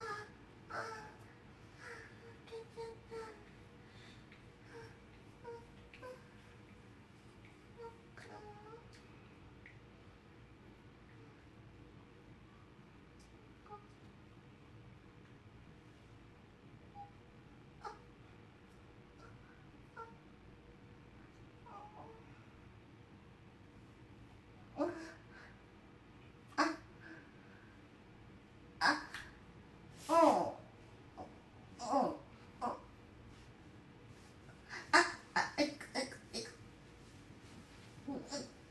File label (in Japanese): 正常位中出し; Audio Denoise